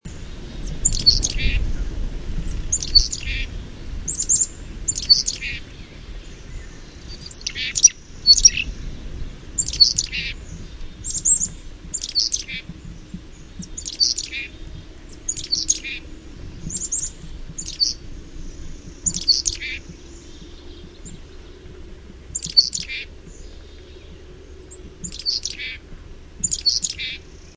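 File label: Black-capped Chickadee gargle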